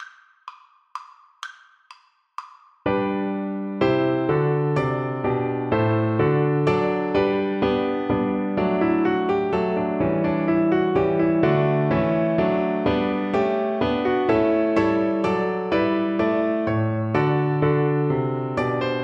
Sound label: sonata, Cello; marcello